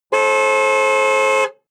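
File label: sports car exterior horn single long; verkehr-transport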